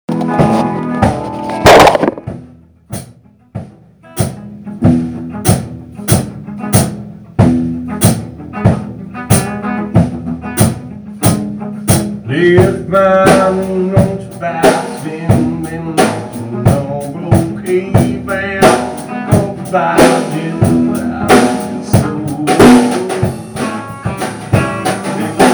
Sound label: slow country riff and then roc; Fast Slow; rockabilly